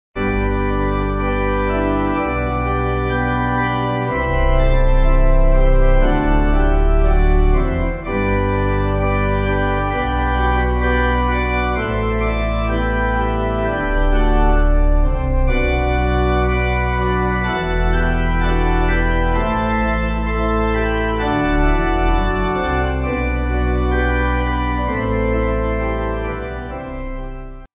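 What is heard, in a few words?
Pipe organ, church music backing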